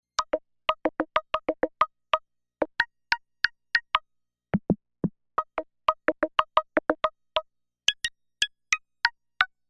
Claves

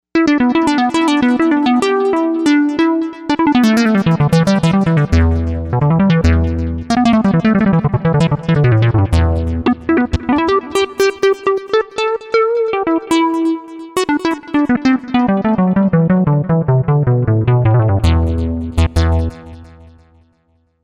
Hydra, Novation UltraNova; Factory Patches

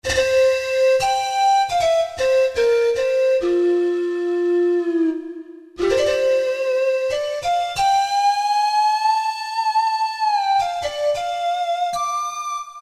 Bottle Blower, Roland D-50; Factory preset demos

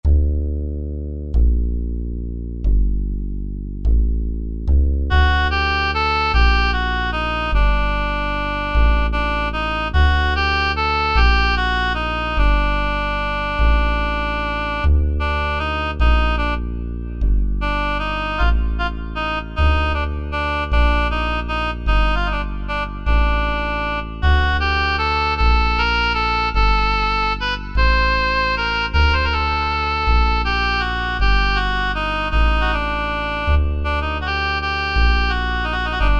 Upright & Oboe, Korg Wavestation A-D

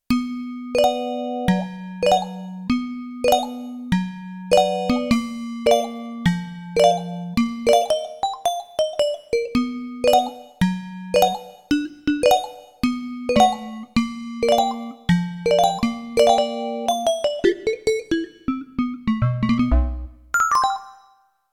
Guitivitty, Roland D-70; Factory Performances

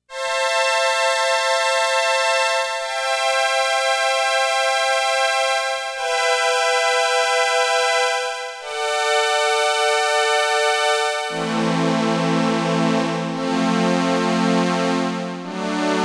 High Strings

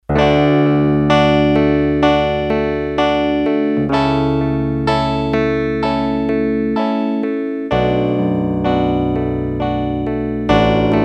Piano, Korg DS-8; Factory Patches

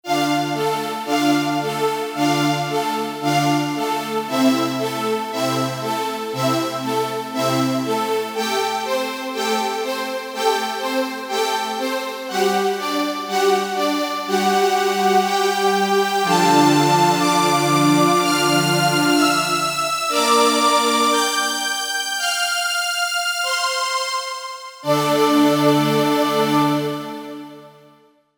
Strings, synth

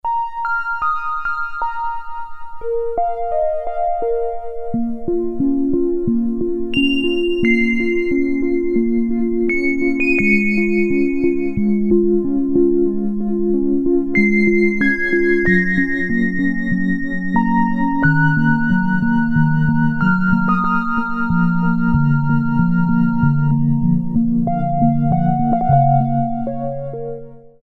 Celeste, Korg Poly-61M

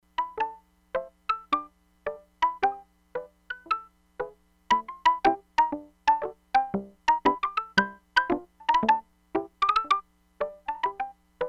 HAMMOND PERCUSSION, Crumar Bit 99; Factory Programs

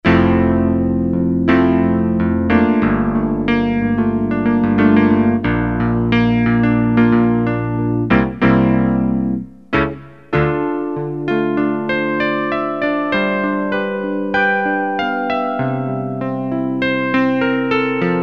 Piano

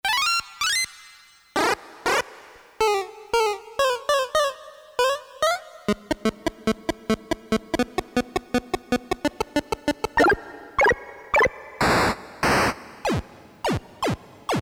Famikun, Video Games, Korg DSS-1; Orchestra

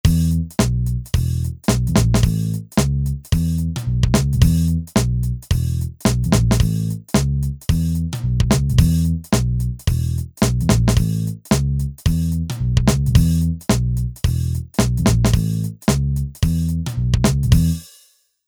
Country

